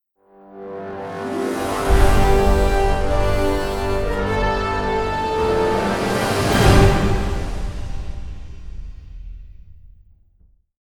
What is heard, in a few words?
Death Sting